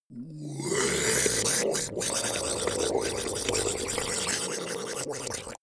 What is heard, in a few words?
ice golem melt groan; koerpergeraeusche; comedy-cartoon; soundpool